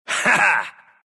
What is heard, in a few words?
laugh, ru; dota2